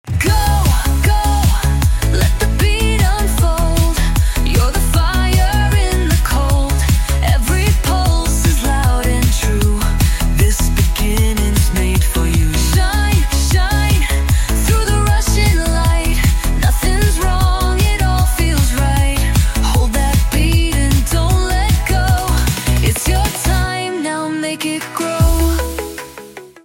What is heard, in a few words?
Heartbeat Start